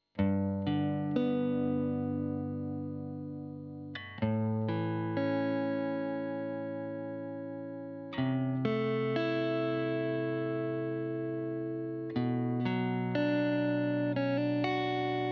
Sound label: GTR Royer